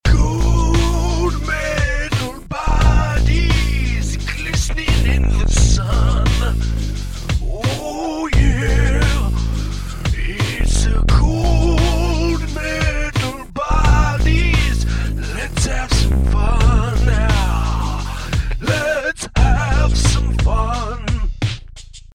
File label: ringtone; Gold Medal Bodies